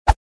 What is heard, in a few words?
Fast whoosh